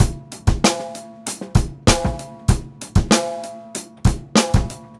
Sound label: beat, Roots Funk; USB Soundscan vol.41; AKAI